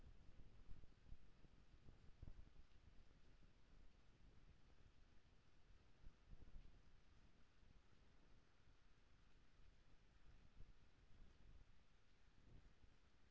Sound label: HYDROPHONE 16000Hz; JASCOAMARHYDROPHONE